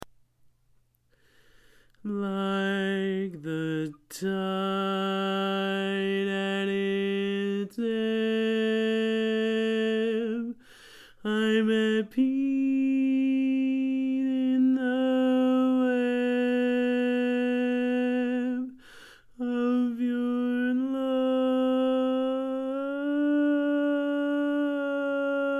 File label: Lead, barbershop tags